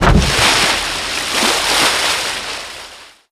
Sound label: explosion water mortar; Explosions